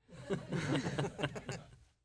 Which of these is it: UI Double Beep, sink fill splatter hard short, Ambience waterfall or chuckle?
chuckle